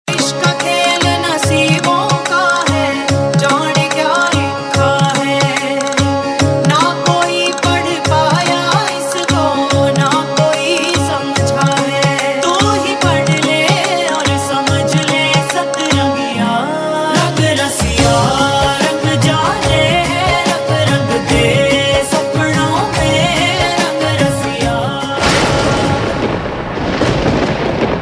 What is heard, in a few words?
Female; TV Serial Tone; Colors TV; Rangrasiya